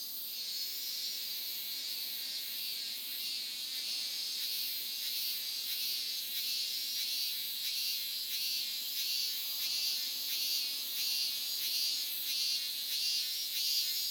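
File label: robust cicada; minnminnzemi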